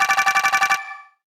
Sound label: ui gem counter short